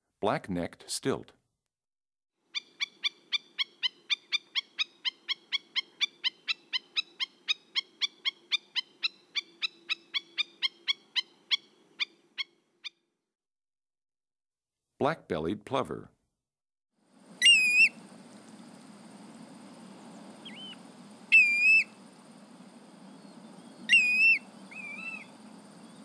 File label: Black-necked Stilt, Black-bellied Plover, American Golden-Plover, Piping Plover; Birds; Eastern-Central; Snowy Plover